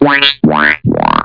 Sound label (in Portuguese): plim plom pum; 7invasores